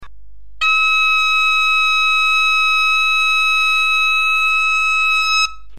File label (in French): mi octave, bombarde